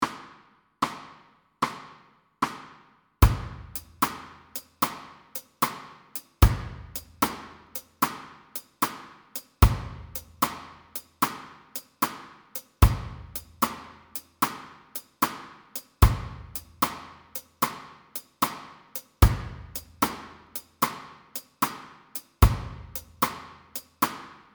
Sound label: Shuffle